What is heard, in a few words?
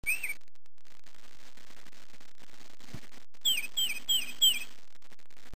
titmouse